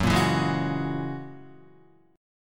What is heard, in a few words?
Gb 9th Gb down Guitar 4F,4A,5C,5G,5C,6E; strummed; JGuitar